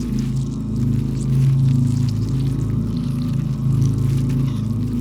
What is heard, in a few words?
ant ship weaver idle; biogenesis